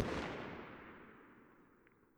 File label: Fire; Weapon Package FortWars